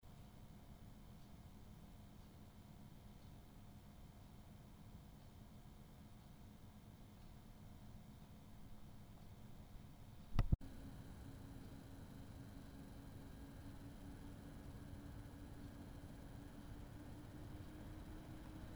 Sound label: asus gtx670; ASUS GeForce GTX 670 DirectCU II TOP